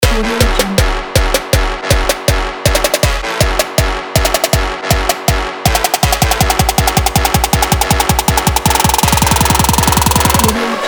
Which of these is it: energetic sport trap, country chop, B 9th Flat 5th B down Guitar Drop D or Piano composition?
energetic sport trap